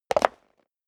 step high heels concrete jump; schritte; Footsteps

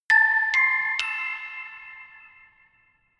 notification glockenspiel creepy triad up minor

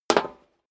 step high heels metal rattle single stair down; schritte

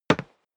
step boots wood parquet single left; schritte

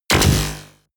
sci-fi buzzing shot; schusswaffen

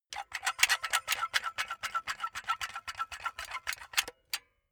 silicone gun empty pump fast; berufe; handwerker; soundpool

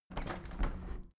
window handle plastic squeak close soft; objekte; fenster

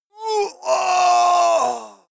male death cry; soundpool

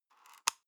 paper box small close soft; objekte

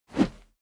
whoosh heavy low; swooshes-swishes

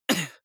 cough male single soft short; soundpool

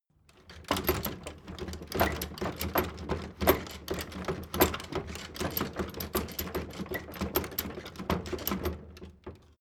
door flap loft rattle

